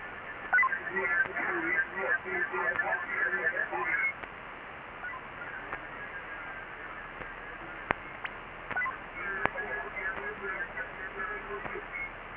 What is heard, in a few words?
CODAN NGT / VOICE ENCRYPTOR